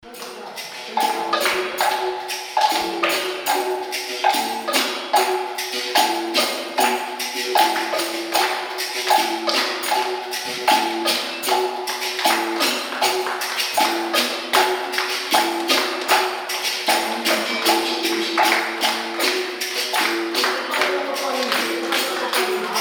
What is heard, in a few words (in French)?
musique roda